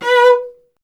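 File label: strings cello B; Miroslav Vitous; Solo Instruments VOL-1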